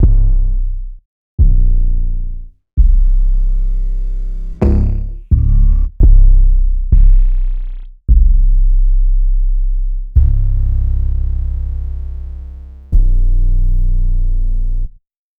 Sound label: 808s